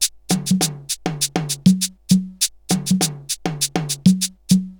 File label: RX17 Perc (100BPM), cyborg beats; musicradar